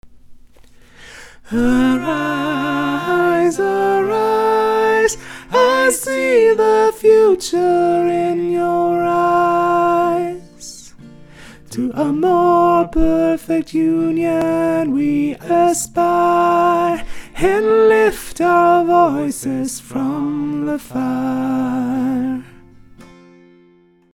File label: Arise alto; BCC choir